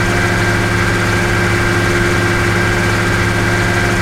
chieftain engine rpm 66, tanks; engines; War Thunder

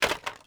ui drop plastic junk; Rust

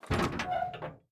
door open metal; Rust

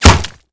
bullet impact body big stereo; weapons; Modern Warfare

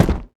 grass run

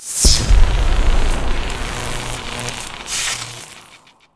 Ping; Civ4 warlords

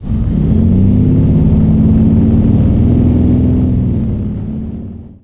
leo2a5 engine int load, tanks; engines; War Thunder

